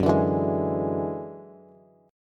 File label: Gb 7th Suspended 2nd Sharp 5th, Bass, Bass Standard; strummed; JGuitar